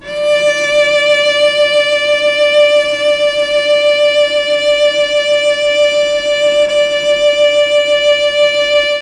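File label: D4 chamber cello, mellotron; CHMBLN CELLO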